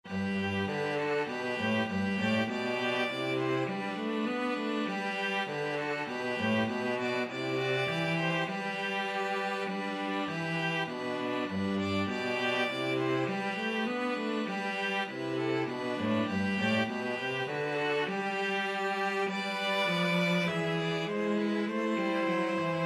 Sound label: string trio